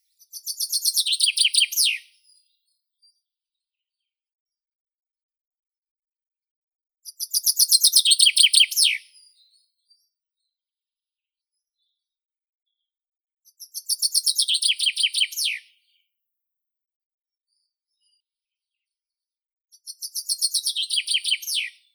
zyablik fringilla coelebs, zvuki prirodi

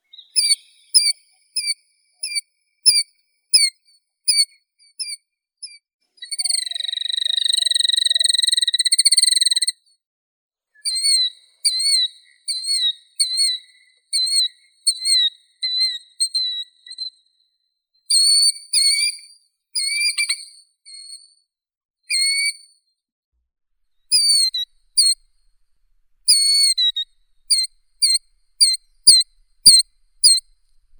zuek kriklivii charadrius vociferus, zvuki prirodi

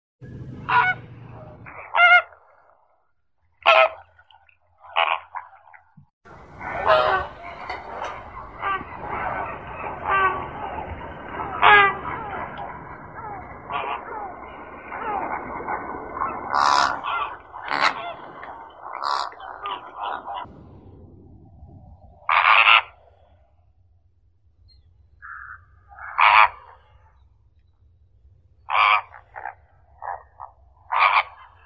flamingo rozovii phoenicopterus roseus

